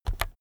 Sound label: keyboard external key press fast soft